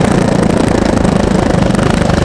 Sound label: roll cobble; terrains; Tony Hawk's Project 8; XBOX